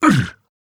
Grunt Hit; ShaLin

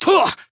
Grunt Hit; Corvus; Paladins

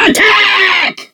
attack; Awesomenauts; Commander Rocket

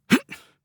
Warrior Grunt Jump; Realm Royale

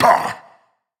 Grunt Attack; Paladins; Lazarus

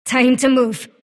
move pain; Dota 2; dark willow

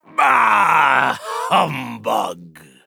Death; paladins; Dredge

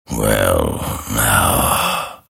move pain; grimstroke

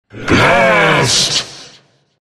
attack; jakiro

